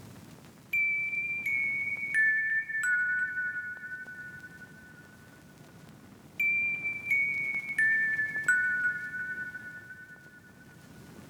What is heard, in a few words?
Lofi Melody Loop 85 BPM B Min Plucks; Melodics; Loops; Loop Stems; Cymatics; Eternity Sample Pack